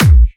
Kick; Play Girl

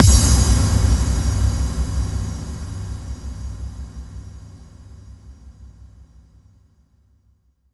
Bassdrums FX, FX Kicks; Vengeance Samples

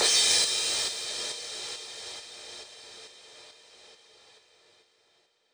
137BPM Crash WET; Drums & Perc; Vengeance Samples; Universe Kit; D minor

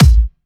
128BPM Kick; Vengeance Samples; Amplify Kit

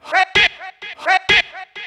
House Loops, Vocal Loops House 128BPM

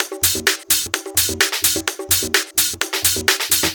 Latin House Loop 128 BPM; Vengeance Samples

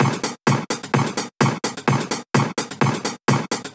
Minimal Loops

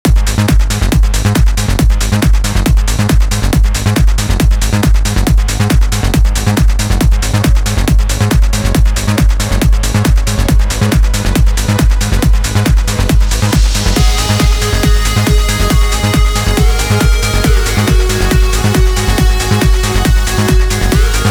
138BPM Complete Mix, G#minor; Vengeance Samples; Zodiac Kit

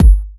Bassdrum; Vengeance Samples

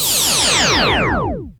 Lazers

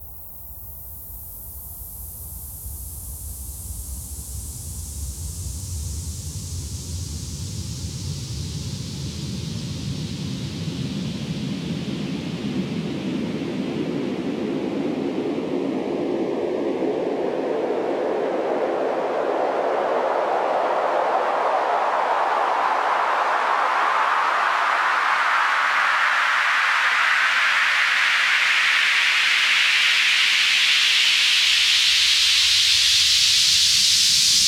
Sweeps Long

